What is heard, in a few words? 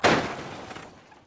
car crash side; lightning speed